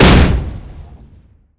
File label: loot expl